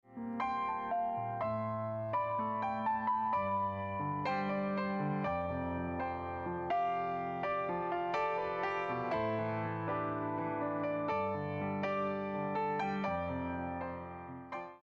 Piano Fairchild 670

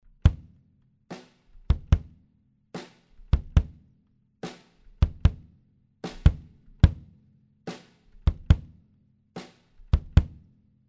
Kick Snare; BLU MOUSE

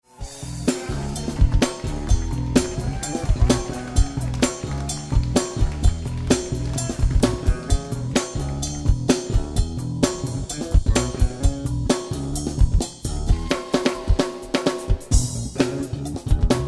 rock

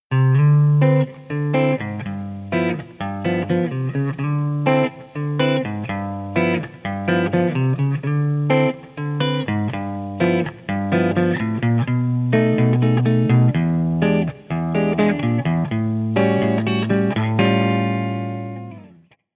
Jazzy

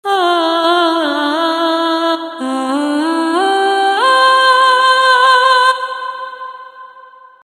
Solo Vocal Stretch

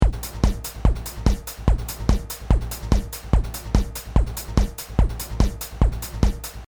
TECHNO

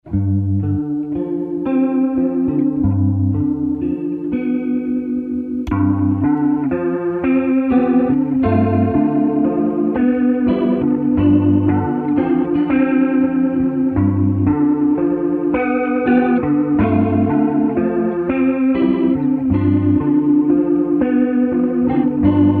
Leslie Guitar

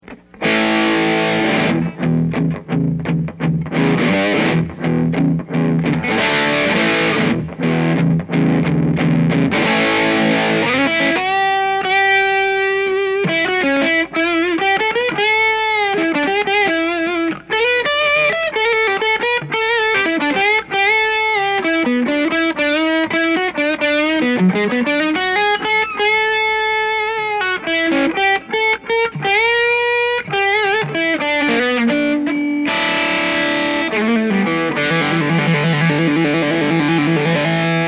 Fuchs OD1; Red Fang 335R

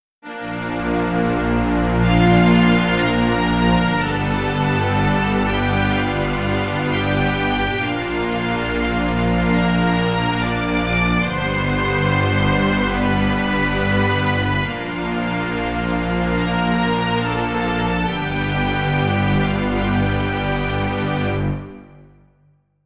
All Strings

